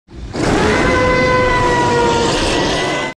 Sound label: howl; mhp3rd; jinouga